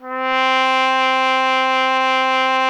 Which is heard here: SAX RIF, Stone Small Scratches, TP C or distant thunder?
TP C